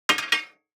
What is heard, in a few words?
DICE on Metal, Throw and Roll, Standard, 1 One Die; Samples; ArticulatedSounds; DICE Sfx Party (Extended)